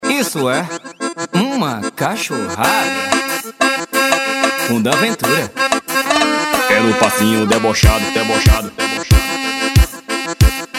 funk pisadinha sertanejo

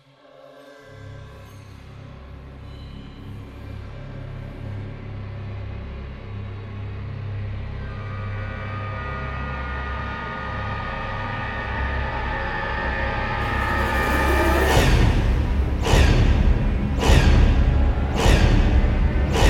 sound bass automotiva; uba mg

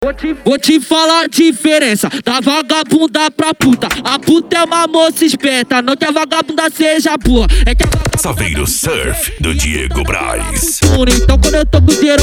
funk consciente e funk ritmado

